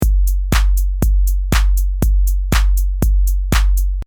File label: drums